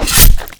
attack; Dota 2; antimage

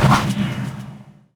dispose cast; dota; marci

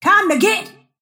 move pain; snapfire

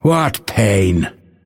move pain; dota; phantom assassin

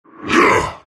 grunt; terrorblade

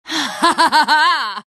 lina laugh; dota

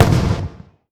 battery assault impact; weapons; dota; rattletrap

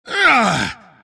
pain; dota; omniknight

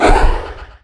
impetus; weapons; dota; enchantress

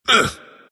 embr pain; dota; ember spirit

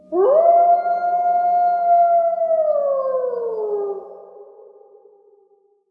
wolf; dota; ambient; soundscapes; diretide

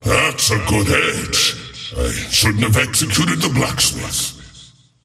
swipe; skel arc; dota; skeleton king

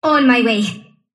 sylph move pain; dota; dark willow

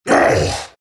morph pain; terrorblade

